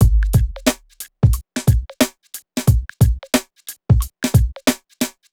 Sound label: Urban Beat, Drums